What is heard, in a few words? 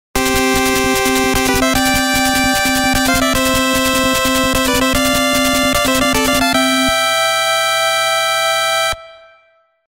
mmsoundtrack; Mega Man 2; gamesoundopedia